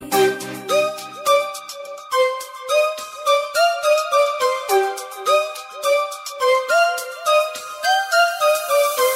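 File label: Violin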